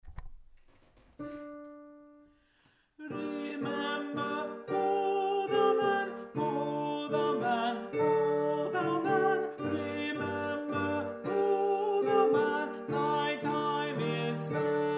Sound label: Remember O Thou Man Alto; Remember, O Thou Man, T. Ravenscroft